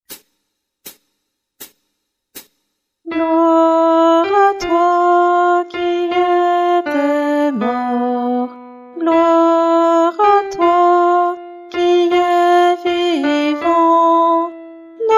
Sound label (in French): Messe de St Paul Anamnese Guide chant Alti